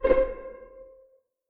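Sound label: string pizzicato C4 pianissimo left; Sound On Sound - Peter Siedleczek's Orchestra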